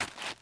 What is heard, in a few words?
dirt stop, footsteps